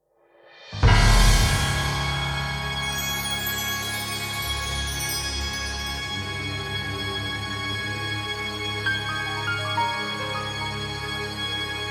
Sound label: Battle, Tension